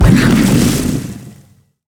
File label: blood laser medium